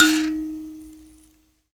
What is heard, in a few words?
PLK Grand Mbira; Roland LCDP11 Africa VOL-1; M'biras